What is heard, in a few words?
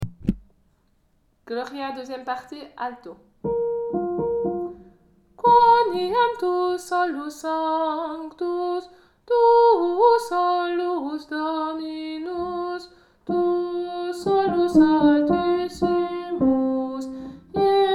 dubra gloria alt